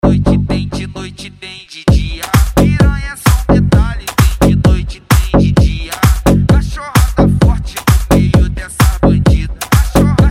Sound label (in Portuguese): mega funk